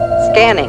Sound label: comp scanning; voy dator